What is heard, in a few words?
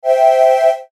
Musical Swell, Musical Swell Flutes; Swells; Sound Effects:Sound Design; Blastwave